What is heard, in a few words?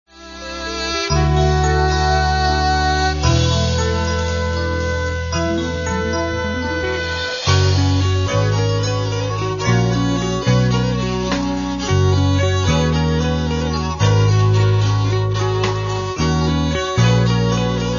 chimer